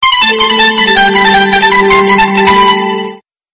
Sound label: short pitch message tone ringtone; galaxy sms ringtones